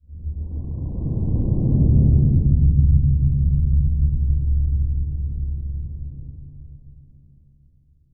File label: ambience music; Bucket Game; coderbuild